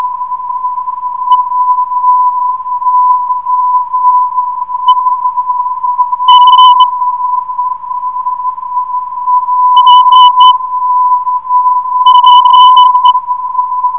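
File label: py 396.5khz, non-directional beacon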